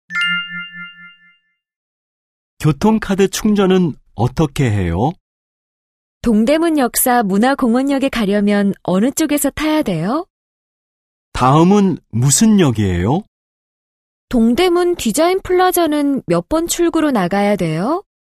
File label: K-POP